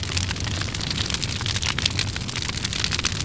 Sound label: fire loop, tank